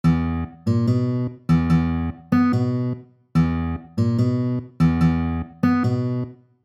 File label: bossa nova bass notes